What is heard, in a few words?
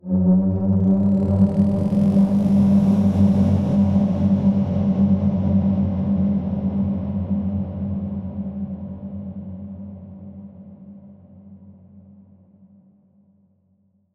FX Distant Semi